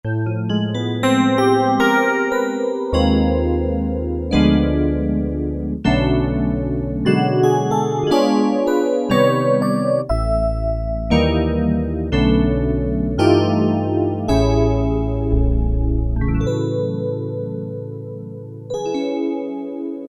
Electric Piano 2, Roland MKS-70

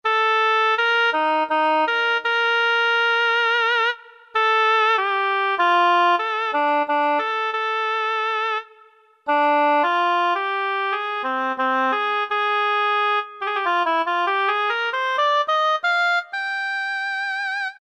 OBOE; Roland SN-U110-06

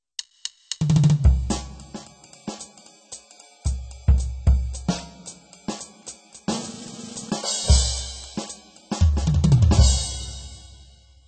jazz kit, Yamaha PSR-530

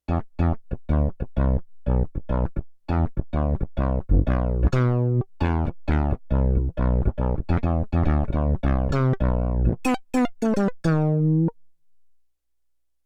Rubber Bass, Roland VC-1

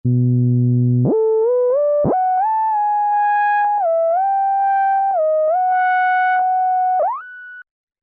Roland V-Synth; PortaMinimal; Factory Patches

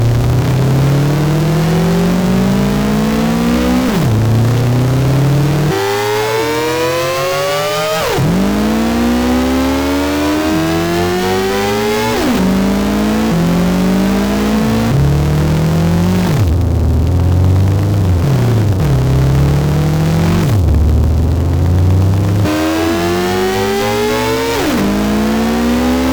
Red Zone, Korg Radias-R

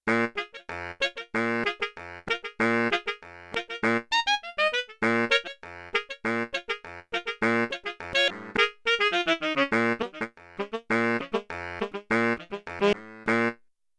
Baritone & Tenor + Band; Yamaha TX16W; Big Band Performance

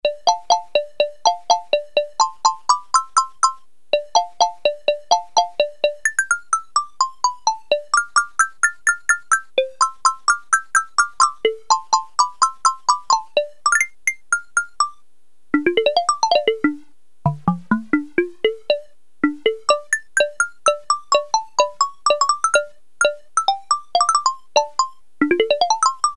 CRISPY XYLOPHONE, Casio CZ-1000; Preset

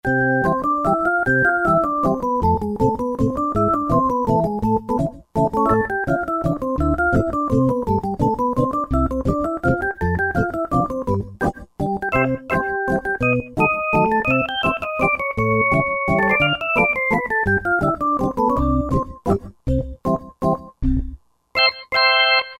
Flute Organ; Factory Patches

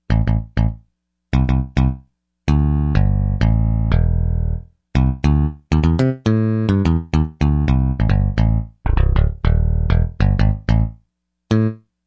Pick Bass, Yamaha TG55; Factory Presets

